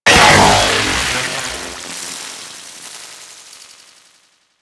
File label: Microwave Pistol Death Burst, Weapons; Grineer